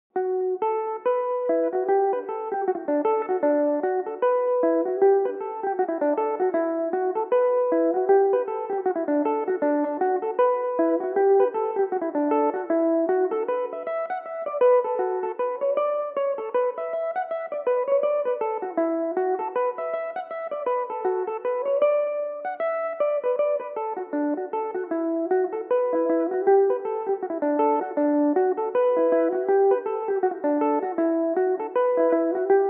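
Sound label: reel E dorian, Irish mandolin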